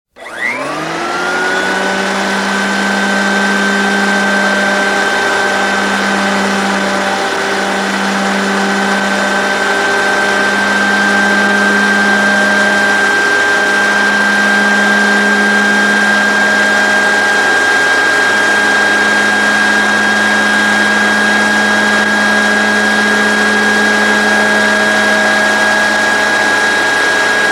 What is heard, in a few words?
electric grass trimmer whipper snipper